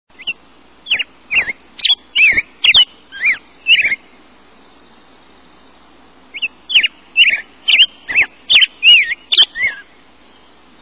Black headed Grosbeak

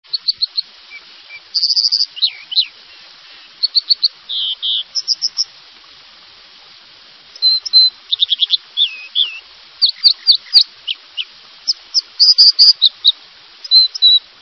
American Dipper

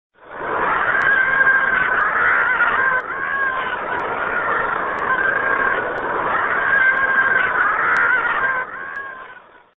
Ancient Murrelet

